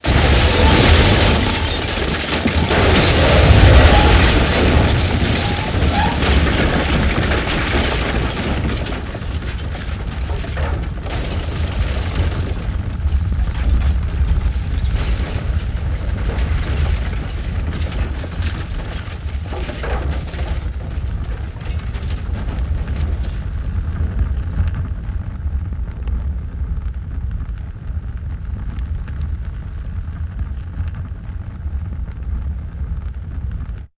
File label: Multiple explosions with debris scattered